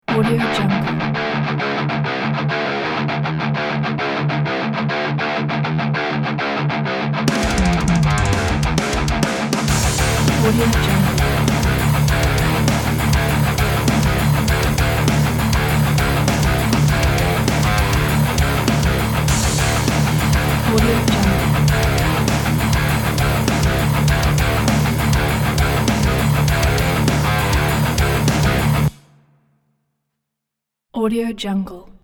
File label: huge heavy metal